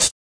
hat

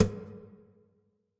Nylon Hit, Guitar Body and String Hits

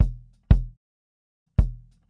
kick, drums

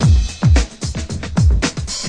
110 deep head, drum loops; 101 to 110 bpm

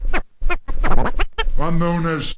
turntable known as, scratching and turntablism; DRUM LOOPS and BREAKS

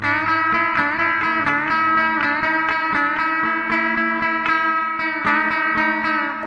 twisted blues guitar lick; GUITARS

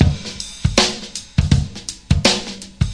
081 bpm super swinger, DRUM LOOPS and BREAKS

